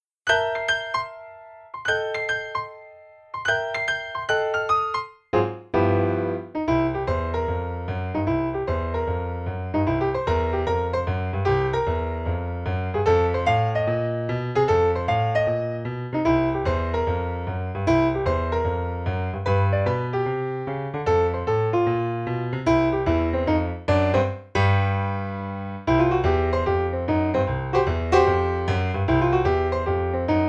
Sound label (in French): Piano seul